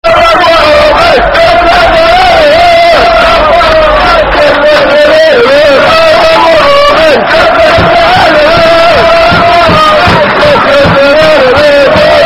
Blue And White Army [Football Chant (Soccer Song)], Gillingham; Blue And White Army (With Sound); fanchants; Gillingham Gfc